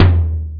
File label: toms